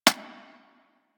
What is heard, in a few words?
Sentient Brachiolyst Step Default, Steps; Warframe; Sentients